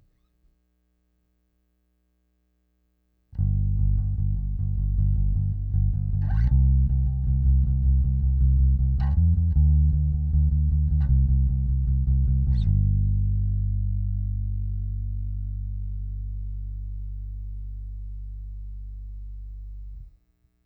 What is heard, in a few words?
Bass